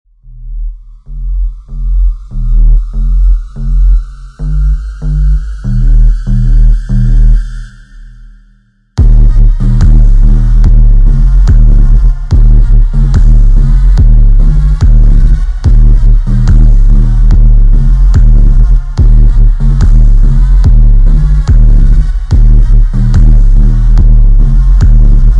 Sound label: Brazilian Phonk Dance Slowed drift Bass Music Remix, Drift Phonk